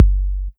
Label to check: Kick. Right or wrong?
Right